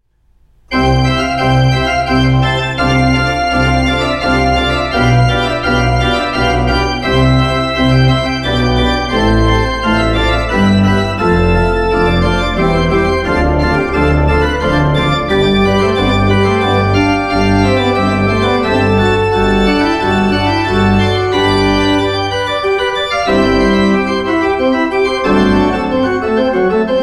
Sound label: Prelude in classic style; Haslum kirke; Bryllupsmusikk